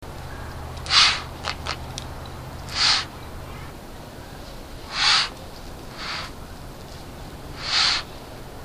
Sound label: snowy owl; owls